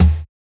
Kick, jungle breaks drum sounds

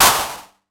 909, DIST; Wizoo - Platinum 24 Electronic; 909 DIST P2

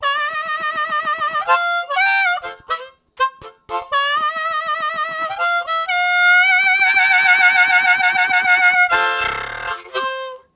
Mid Vibrato + Roll, Harmonica Riffs; Zero G

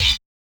Yamaha DJX 2B, Hit

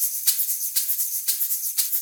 Shakerz, Percussion Loops

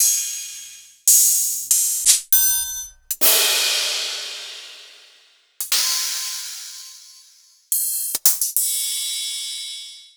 808 trap HH Cymbal; Sliced

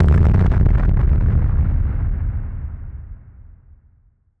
Drum Bomb; musicradar; bomb factory; Drum Bombs

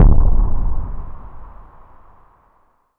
WINDY THUD, Impacts, Hits & Booms; Distored Reality

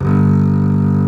G1 arco bass; Northstar - New Gold VOL-2

